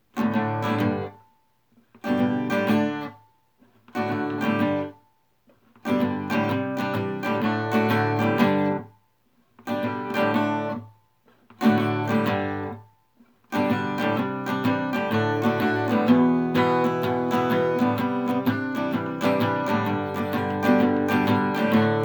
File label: acoustic; brick and mortar; best appliance oasis